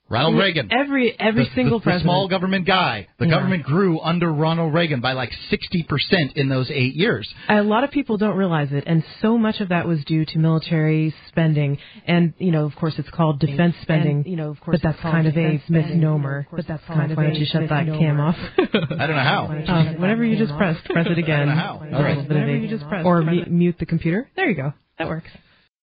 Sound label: loop